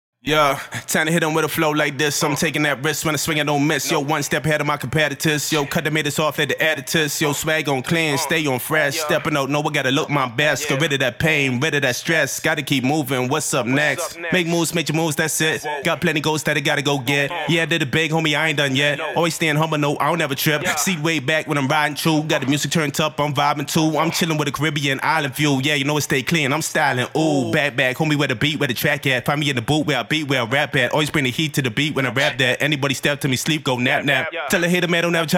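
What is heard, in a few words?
hip hop 143 E minor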